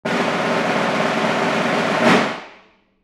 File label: Snare rolls; drums and percussion